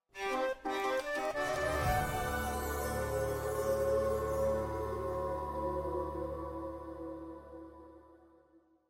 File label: Chest Sold; Ashen Chest